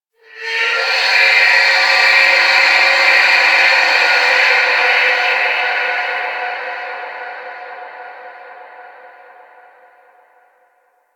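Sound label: Distant Metal Bend, Shipyards; Warframe; Grineer Shipyards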